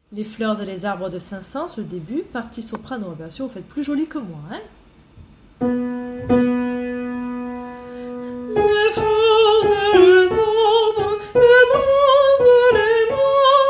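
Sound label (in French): partie soprano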